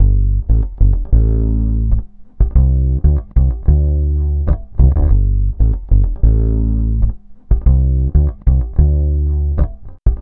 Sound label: Vintage Fretless, Electric Bass